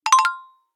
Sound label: that was quick, message tones